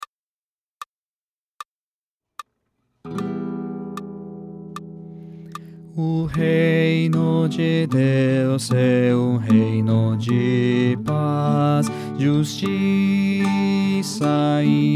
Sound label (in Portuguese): Baixo